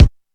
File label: thick kick drum one shot D# key; D sharp key